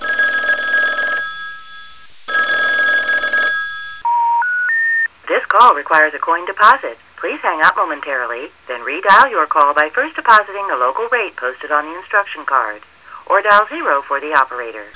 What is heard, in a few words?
phone